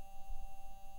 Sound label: mic, mars2020 edlcam